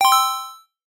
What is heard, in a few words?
tick